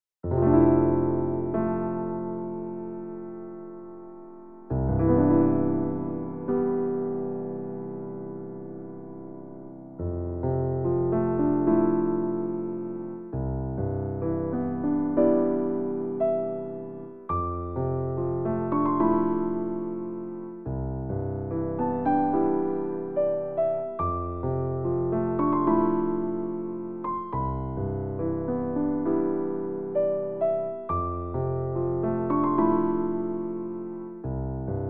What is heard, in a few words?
Title Theme Piano; The Legend of Zelda Ocarina of Time